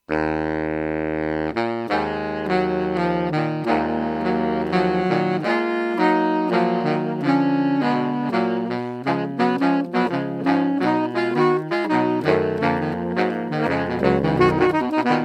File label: sax